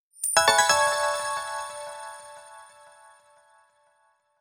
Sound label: platinum medal acquisition jingle; bayonetta